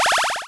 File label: coin hit; knex; makeTheConnection